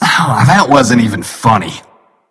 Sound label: death; smite; ra